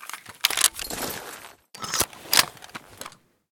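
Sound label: l85 grenade launcher reload, weapons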